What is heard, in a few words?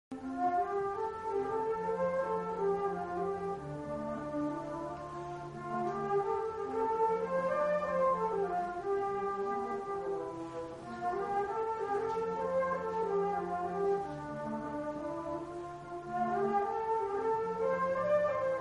concert, flute